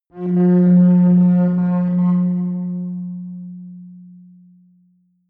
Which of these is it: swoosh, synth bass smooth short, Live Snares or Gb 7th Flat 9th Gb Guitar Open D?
synth bass smooth short